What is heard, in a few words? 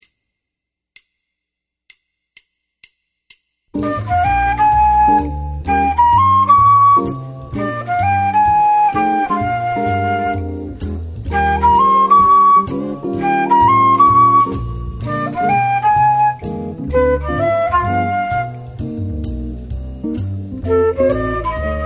simple blues